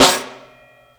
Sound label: Jungle Snare, Snare One-Shots; Drum n Bass; KJ Sawka; Drum n Bass & Beyond; Drum Tool Box